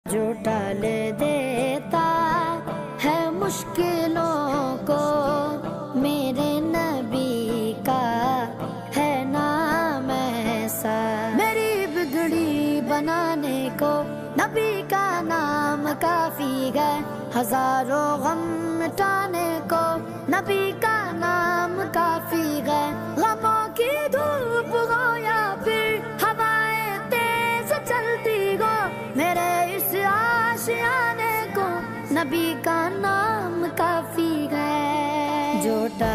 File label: mere nabi name kafi ringtone islamic naat ringtone beautiful naat